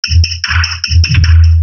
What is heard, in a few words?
Default Vocoder 150BPM